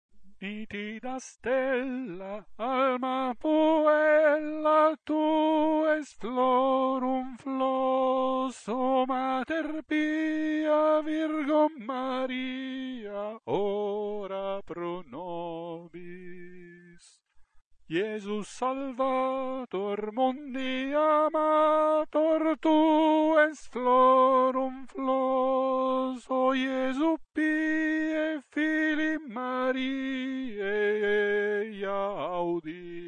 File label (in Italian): Nitida stella - Tenori